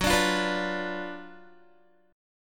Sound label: Gb Major 7th Suspended 4th Sharp 5th, Guitar; GbM7sus4#5 strummed; 14,17,15,16,14,x; 4E,4A,5D,5G,5C,6F